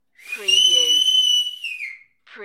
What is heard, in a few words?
Big Whistle